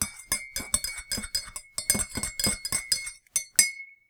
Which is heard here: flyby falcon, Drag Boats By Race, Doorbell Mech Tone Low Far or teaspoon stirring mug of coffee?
teaspoon stirring mug of coffee